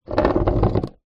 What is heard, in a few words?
sport surfboard scrape drag concrete ground fins